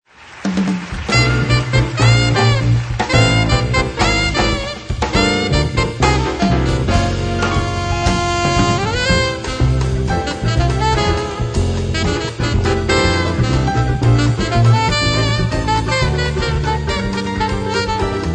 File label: gafieira jazz